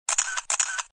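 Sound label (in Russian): фото снимка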